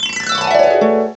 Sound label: HARP DOWN; CMI Sound Library Rev 1.3